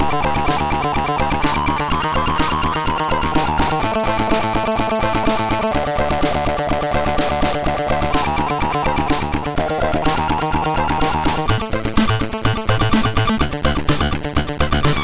Hires Demo Kit, MOS6581R3

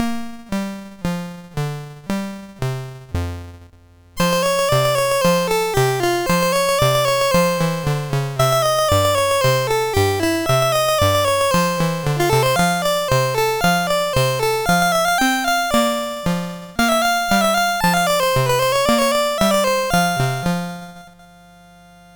sid MOS6581R2, GAMES; Face Off

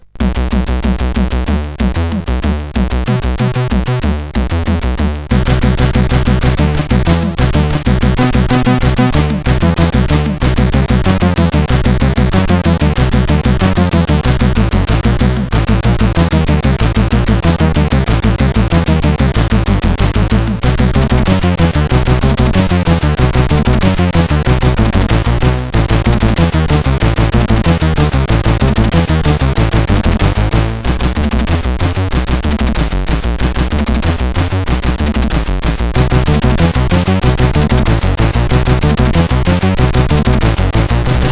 Short Techno,sid CSG8580R5

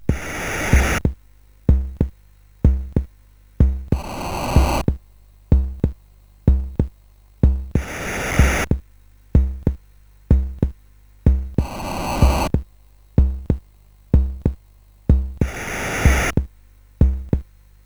sid, CSG8580R5; Gunfright

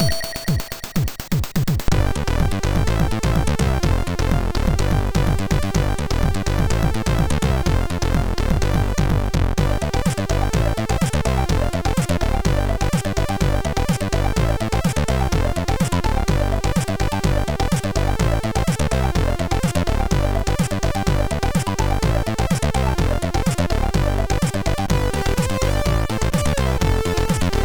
Accord, MOS6581R3

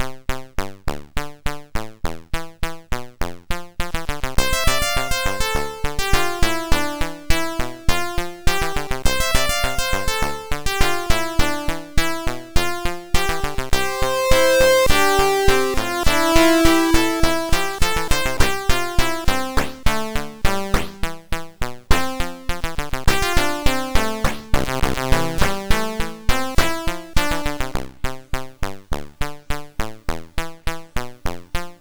Super Synth Sample, sid MOS6581R4

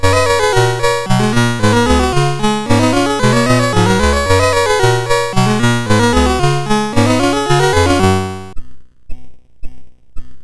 sid MOS6581R4, GAMES; Castle of Life

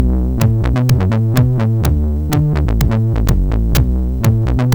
Simple Bassline MOS6581R4

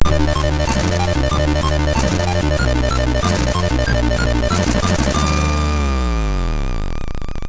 Jingles, MOS6581R2; Freeway 86

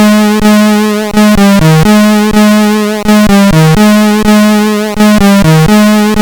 Melody, sid CSG8580R5; HVSC; 3-Tunes

